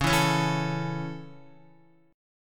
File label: C sharp Minor Sharp 5th down Guitar; C#m#5 strummed; JGuitar